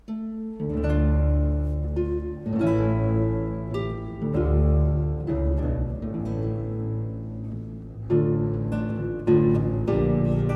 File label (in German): d moll walzer